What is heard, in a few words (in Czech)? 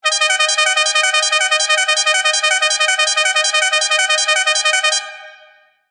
fanfara 3 tonova 12v stebel bp3 trumpeta